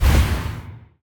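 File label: enemy attack finger magic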